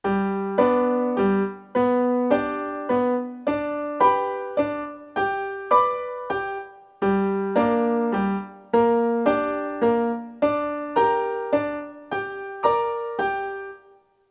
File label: triad and inversion rhythm in G and Gm